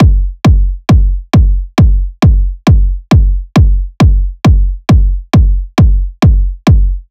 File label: Kick Loop 135, Techno Drums